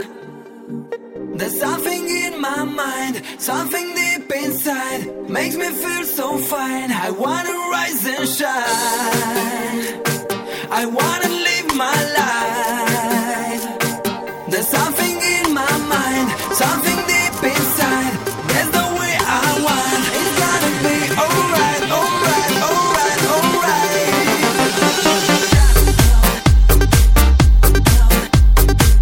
Club Hit

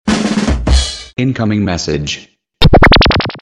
Cool Sms Ringtone

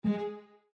Violin chord

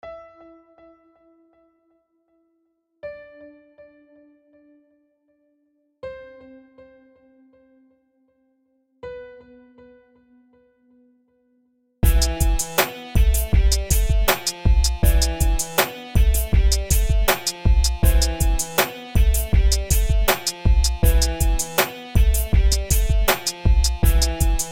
Beat

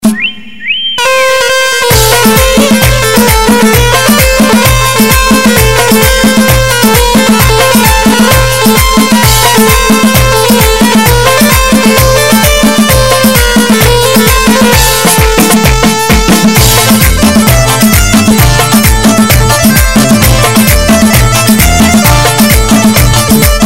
Bandari

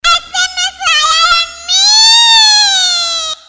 Sms Tone

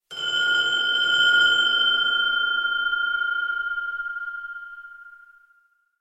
hunters bell

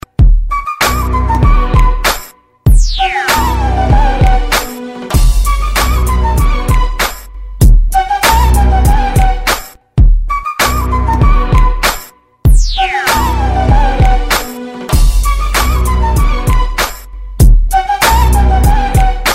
Arabic Rnb

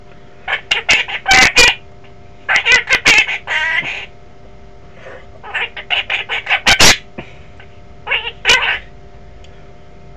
Quaker Parrot

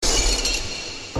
Message glassbreak

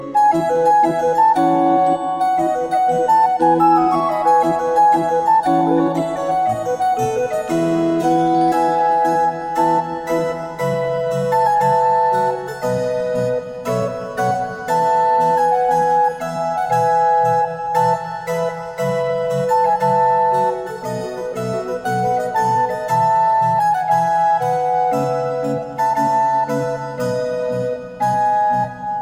Rondo

